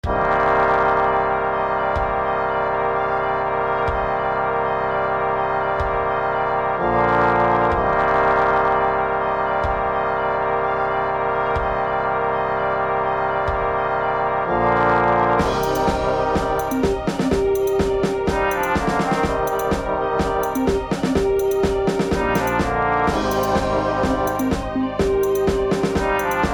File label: broken heartache medieval